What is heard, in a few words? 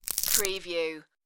tiny crunchy bite